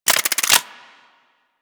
Hand Shotgun Chamber Spin; Grineer

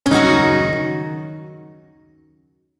Yo Chord D; Warframe; Duviri Shawzin

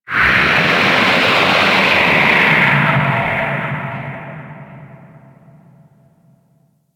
Ocean Kraken Bellow; Ambience; Warframe; Grineer Ocean

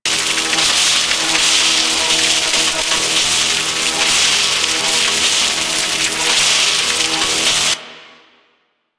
Electric Arc Loop; Ambience; Props; Warframe; Corpus Railjack

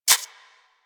Rodent Step Dirt; Characters; Foley; Warframe

